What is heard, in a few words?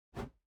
mobs jump